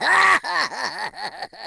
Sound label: laugh; King Boo's